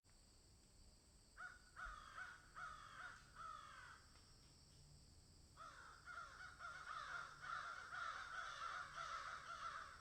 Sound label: crows caw in field